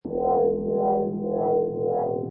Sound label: Space Scifi Sound, Sci-fi, oscillati; oscillating and gyrating strange machine or rotating device; Science Fiction Sound Effects